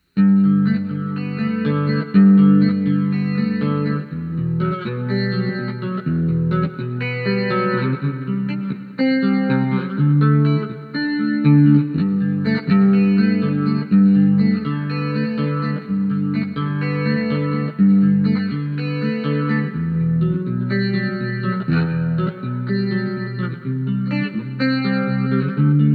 Subtle: Depth at about 10am, Rate at 12pm, Width at 10am (added a touch of reverb), chorus, guitar